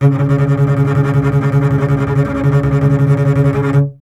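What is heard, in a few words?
string contrabass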